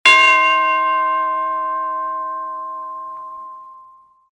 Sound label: Chime; WABC